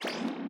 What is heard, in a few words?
Whiping Laser